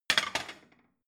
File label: plate shelve slide